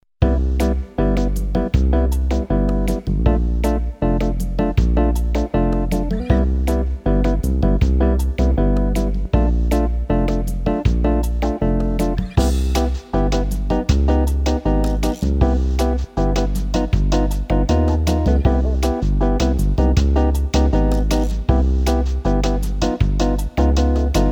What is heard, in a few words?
Bossa Nova Underscore; clone drone in the danger zone